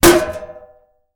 Tin Can Impacts Dull; pots & pans

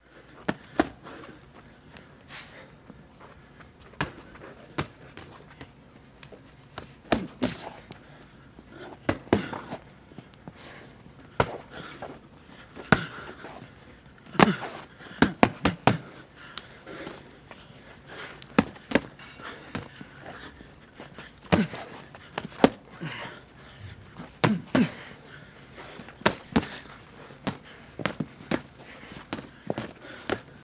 Boxing Loop No Specta

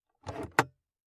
glove box open

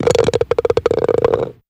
Golf Ball Hole

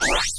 ELCTRO QUICKER SWEEP, sci-fi space fx

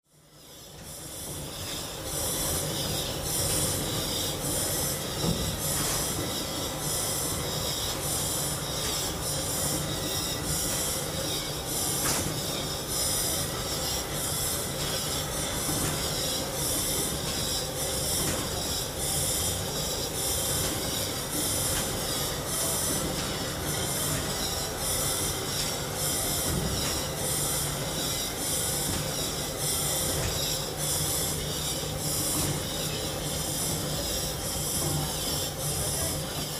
Steam Pump Machine Ro

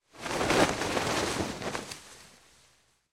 Flag Flaps Flag Flaps; flags

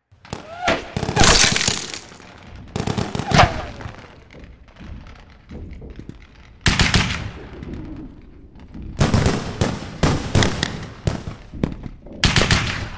Street Battle Mixed W, modern war bg city

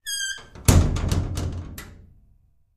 Vault Inner Door Close; safes & vaults

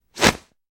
Nylon Swishes Quick, whooshes & swishes

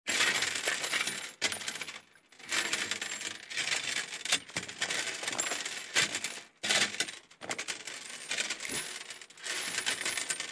Shell Case Feet Steel, Shell Casing Movement

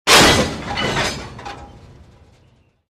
Train Coupling Metal, couplers

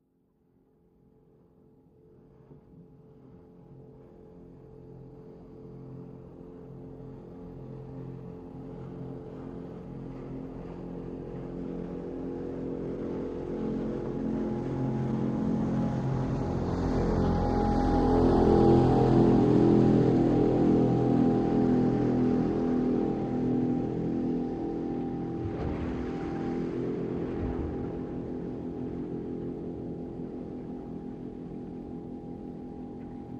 Military PBR Offshor

